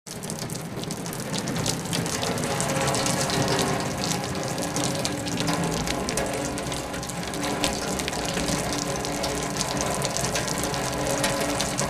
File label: Rain Steady Heavy Rain